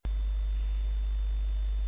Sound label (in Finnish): usb häiriöääntä